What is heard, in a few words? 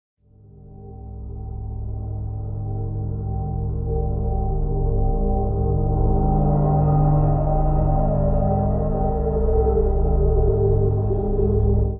Texture 80 Bpm; MIDI Clips; Instruments; Hallow; Ableton; Drone Lab